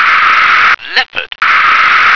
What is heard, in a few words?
leopard